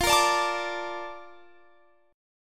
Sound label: A sharp Major 7th F